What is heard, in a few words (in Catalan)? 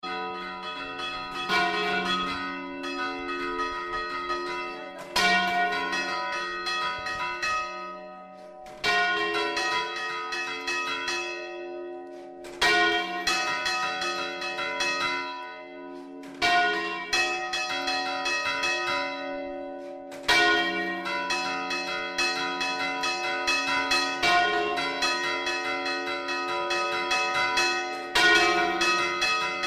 campana